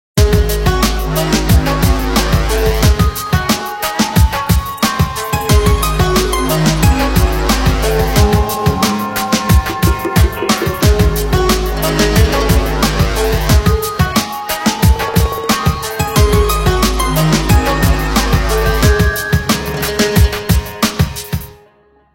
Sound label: Swipper; Nokia N86